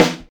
original steel snare drum sound e key

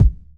short kickdrum c sharp key; kick drum samples

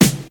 Hip-Hop Steel Snare Drum Sound G Key

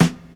modern dance steel snare drum sample E key

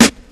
sizzly acoustic snare sample a sharp key; Duration 0:01; Samplerate 44100 Hz; Channels Mono

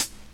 old school hip hop hat sound c key; hat samples

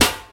sharp snare sound d key; snare drum samples

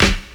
smooth bottom end snare C sharp key

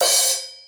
dark open hi hat one shot g key; Duration 0:01; Samplerate 44100 Hz

